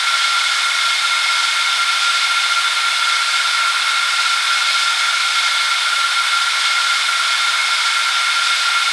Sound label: Aircrafts Engines F15 Front Engine; DCS